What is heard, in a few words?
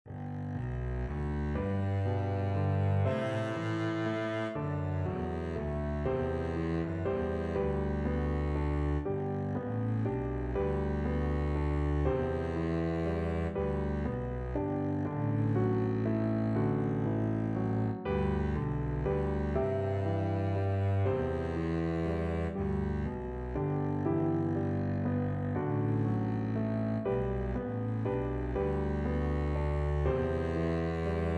morning has broken, Double Bass